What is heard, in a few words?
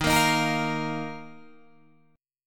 D sharp Suspended 2nd D sharp, Guitar Open D; chord shape